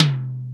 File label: high tom sound d key; tom drum